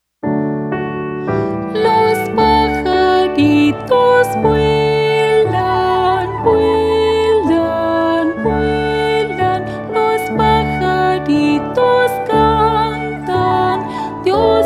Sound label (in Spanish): Los arboles se mecen pajaritos